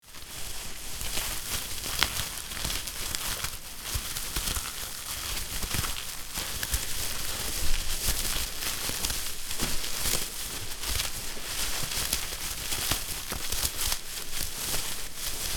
Fishing net handling rustle

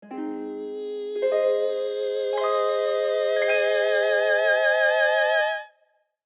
Angelic choir with heavenly harp

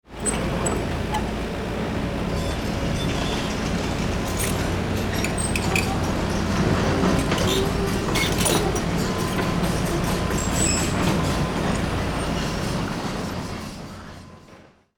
Excavator construction vehicle working

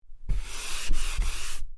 Chalk eraser swipe; Chalk and Chalkboard

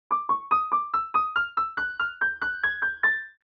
Cartoon sneaky

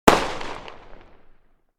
Fireworks single explosion shot

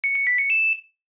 Appliance power on jingle startup; Home and Household

